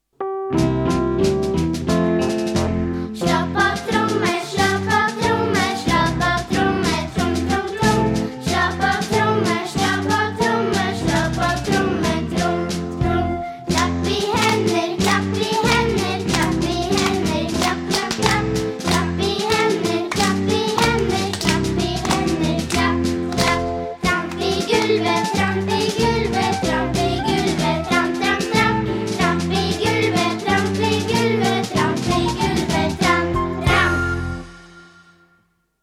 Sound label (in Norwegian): Slå på tromme